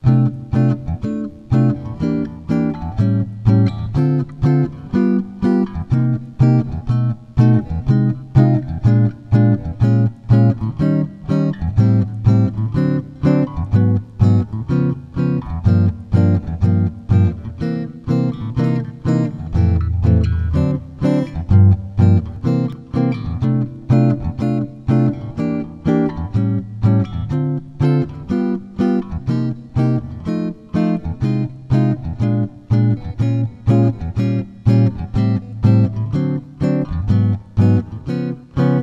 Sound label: Chorus, jazz tunes